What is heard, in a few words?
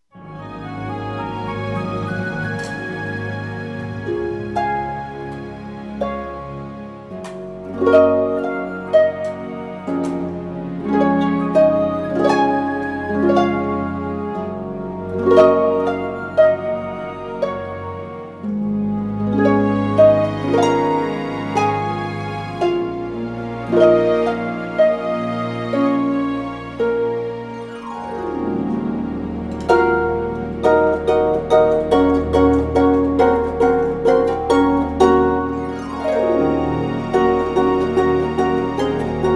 HARP